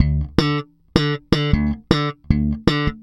Slap, Bass; Bass X

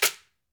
AMAZON SHAKER, Latin Shakers

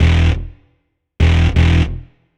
Roller Bass; Dr. Fink's Funk Factory

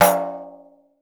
10 PANDE, PANDEIRO

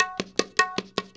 India, Heart of Asia

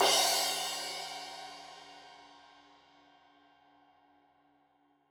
Crash 15in L edge, Cymbals Stereo

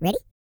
E TALK, Vocal Planet R&B Dance; Spectrasonics

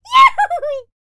shouts; ILIO; Vocal Planet

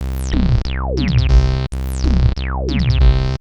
Analoop, Industrial Analog

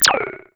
KWERK, MACHINE PERC; Zero-G Ambient 1

